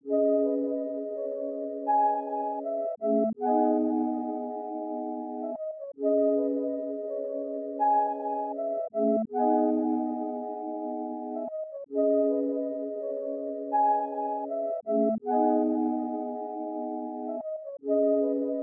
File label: 162 BPM C# Min Keys, Loop Stems; Melody; Melodies; Cymatics; 2022 Melody Mystery Expansion; Feelings